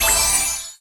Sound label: Magic; ChooChoo Heroes